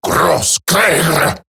Surprised Male; en; Dialog; Barks; Battle; Grineer; Eximus

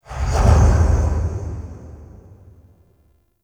Memory Room Vision Zoom Out; Warframe; Sacrifice

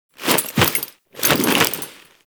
finisher foley; Enemies; Drifter; Warframe; Duviri; Dax

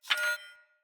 Sword Whoosh Accent; Weapons; Swords; Garren Sword

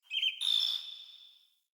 Bird Summon Chirp; Josalfar Bird Guide

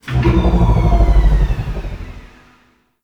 UI Mod Deactivate Engine; Warframe; Railjack; Player Ship

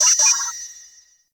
Class UI Wrong; Warframe; The New War; Zariman Class Quiz

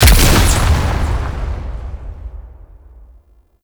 Mimic Explode; Gameplay; Warframe; NineteeenNinetyNine; PvPvE

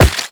Run Rock, Footsteps; Characters; Warframe; The New War; Kahl

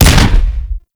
Double Barrel Shotgun Fire Shot; Warframe

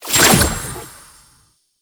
Mirror Defense Rune Shot; Warframe; Entrati

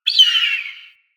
Mountain Forest Predatory Bird Far

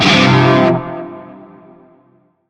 Shawzin Pent Min Chord; Warframe; Narmer Shawzin; Chords

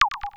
Objective Completed Beeps

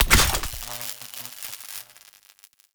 Tripwire Activated; Traps

